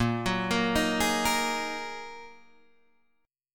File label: Bb 7th Suspended 4th Sharp 5th Bb Guitar Open D